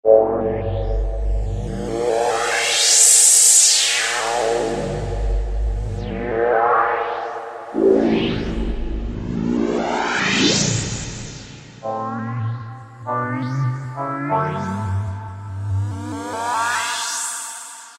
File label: MISTY STORM; Akai SG01v